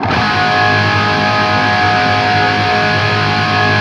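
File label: power G# loop, guitar power chord loop; East West; Steve Stevens Guitar Samples Collection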